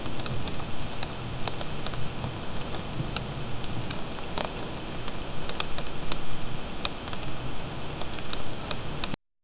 soft rain droplets tappin

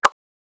small suction cup pull

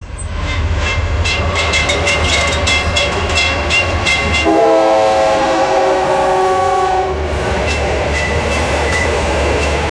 realistic soundscape of a

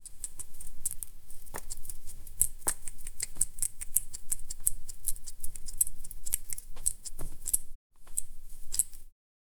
kitchen precise chopping

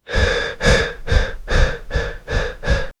running with heavy breath

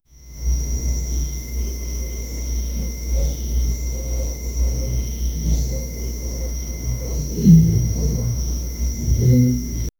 night insects distant owl

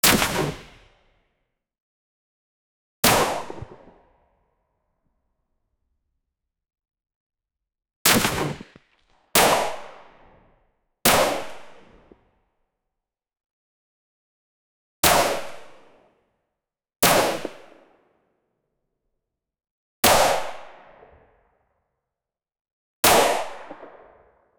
mp5 9mm cyclical noises, gunshot

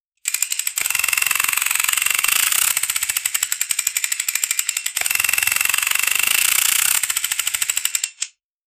grappling launcher reel

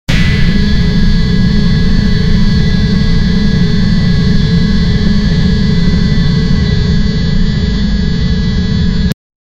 shield block game sound

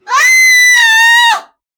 a kid screamed because he

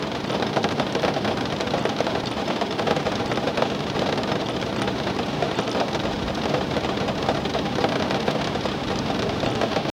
gentle rainfall on a car

